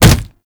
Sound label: Generic Hit; Warframe; Characters; Tenno